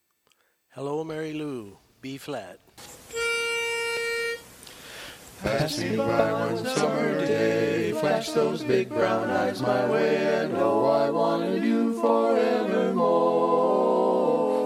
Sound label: 4-part Tenor